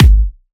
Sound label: clicky kick single shot g sharp key, kick drum one shot tuned to the G# note